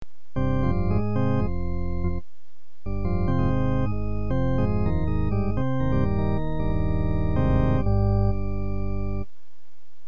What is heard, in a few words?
melo 120bpm